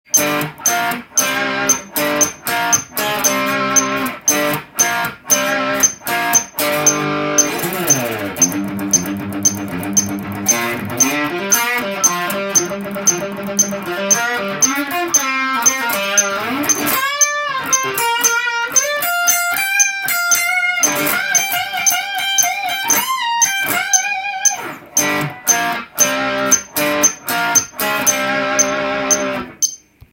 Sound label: rock metronome; solo metronome session